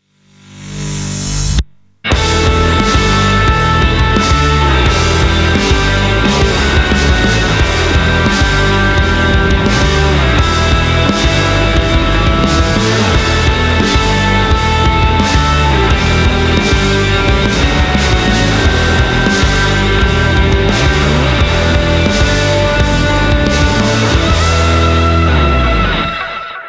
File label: Play Guitar; While Standing